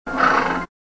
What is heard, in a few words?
Horse Snort